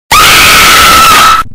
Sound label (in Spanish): grito; screemer